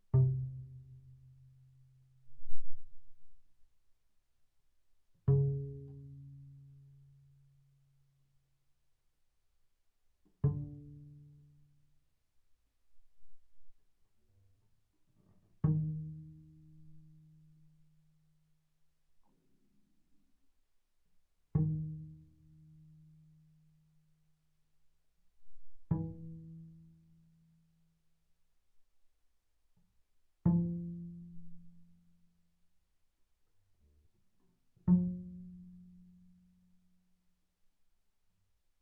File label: Bass pizz sul D mf C3 A3, double bass